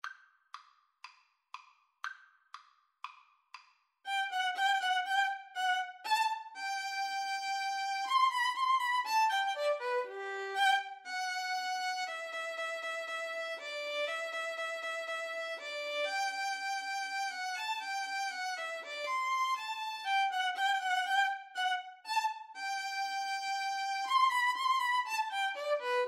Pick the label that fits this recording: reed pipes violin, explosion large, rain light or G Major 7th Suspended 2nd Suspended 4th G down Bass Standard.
reed pipes violin